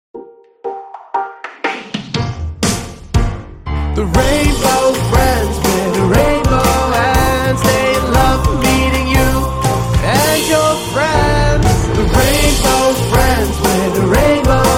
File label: instrumental horror